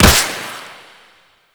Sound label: Flak Cannon Proj Hit; Grineer; Weapons